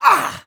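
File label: voice die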